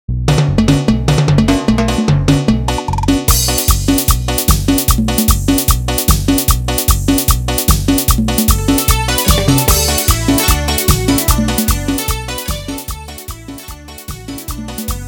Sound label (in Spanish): Instrumental Chicha, BPM 150; Privadas 2025